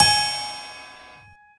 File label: normal hit whistle; osu!